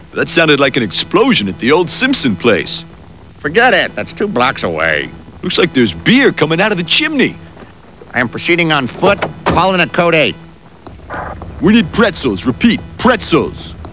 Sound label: explode